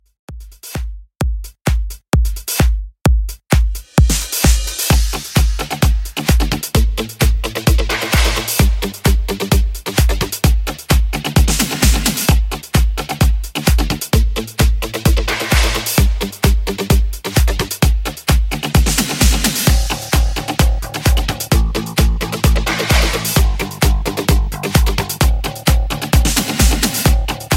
80s Redrum 130Bpm

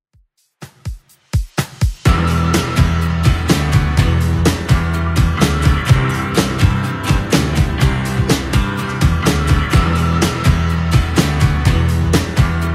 80s Redrum 125bpm

